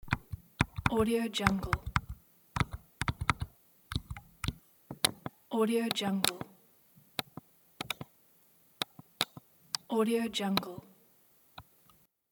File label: gamepad